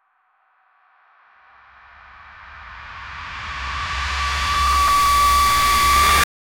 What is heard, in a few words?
cinematic riser sound for, trailer